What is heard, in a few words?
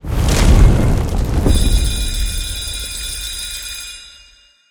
free spin intro; rise of maya